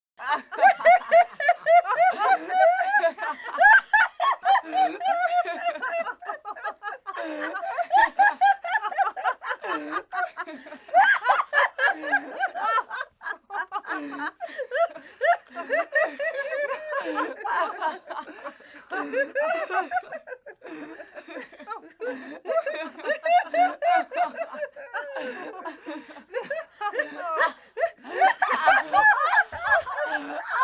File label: old vocals